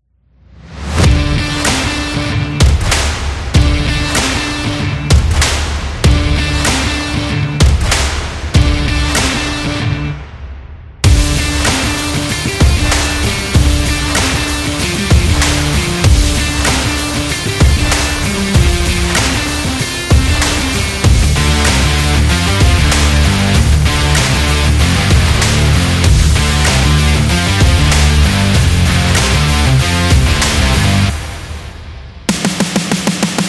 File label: This Is Metallic